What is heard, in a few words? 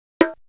Bongo; Coldcut's Kleptomania VOL1